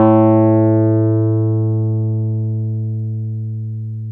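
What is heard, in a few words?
piano, Wurly; Roland LCDP10 Keys of the 60s and 70s 2; Wurly 200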